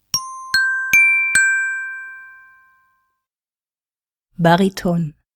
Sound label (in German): Bariton